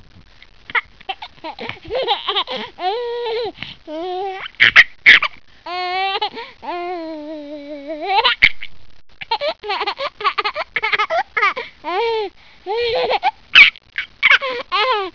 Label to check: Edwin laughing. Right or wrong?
Right